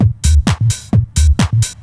Techno; e-LAB

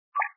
accept; anacroz

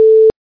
Ring Busy

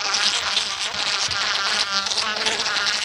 Flies Loop

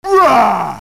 raaaa

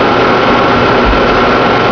atmosphere; KATANA; Daikatana

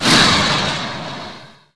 missile

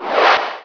menu escape; Daikatana

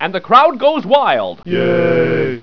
Crowd Goes Wild; Project Magellan Demo v1.02

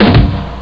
snare drum; percussion instruments

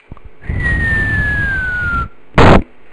Artillery fire; Macworld (1997-09); Games World; Imperialism Demo; Tactical

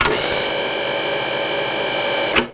landgear; falcon

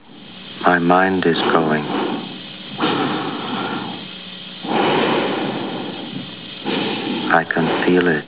Windows 2000 Critical Stop; 250 Desktop Themes

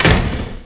DOOR SLAM

